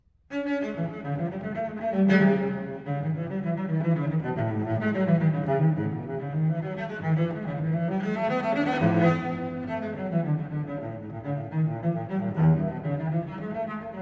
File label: Unaccompanied Cello Suite No. 2 in D Minor Courante